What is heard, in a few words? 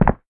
Tile short